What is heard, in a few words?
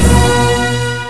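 ORCHESTRA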